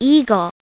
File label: EAGLE; My Science Fair